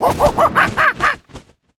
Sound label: Flying Monkey Play